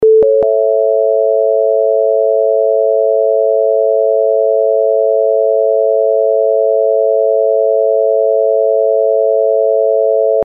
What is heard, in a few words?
major chord